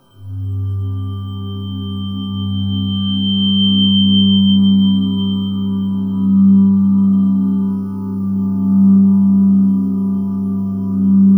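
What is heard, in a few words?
C4 atmosphere; NorthStar - The Wizard VOL-2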